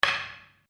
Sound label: Wood On Wood